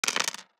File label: DICE on Plastic, Throw and Roll, Standard, 5 Five Dice; Samples; ArticulatedSounds; DICE Sfx Party (Extended)